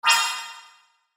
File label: SHIMMER FAST, Shimmer Fast High; Hit; Blastwave